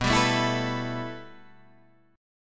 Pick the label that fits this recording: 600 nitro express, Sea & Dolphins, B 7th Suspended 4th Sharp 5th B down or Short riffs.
B 7th Suspended 4th Sharp 5th B down